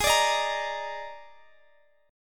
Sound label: D sharp Major 7th Suspended 4th G sharp